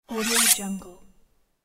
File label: sabre unsheathe